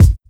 focused kick drum single hit f key; kick drum samples

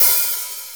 sizzly open high hat sample g key

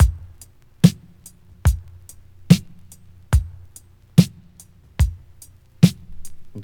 72 bpm breakbeat d sharp key

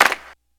short reverb clap single shot c key; single hits one shots

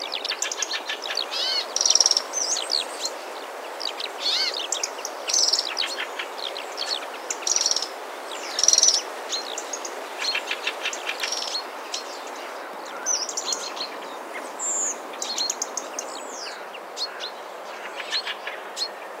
autumn birds song

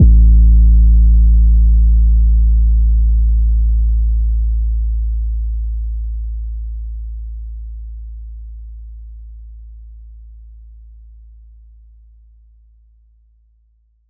phonk kicks oneshot 808 a; kick drum samples

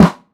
warm sounding snare drum sound f key; snare-drum-samples

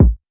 dry steel kick drum sample a sharp key; Duration 0:01; Samplerate 44100 Hz

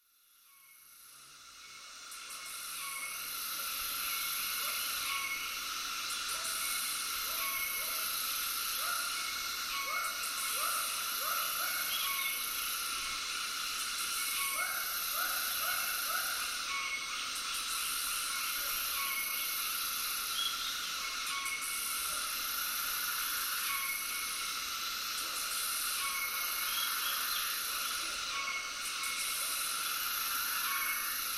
tropical cicadas and frogs

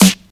original acoustic snare sound g key; snare drum samples

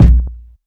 fat kick drum sample a sharp key

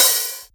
snappy open hi hat one shot b key

